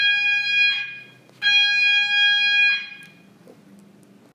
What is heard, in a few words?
Fire Alarm Siren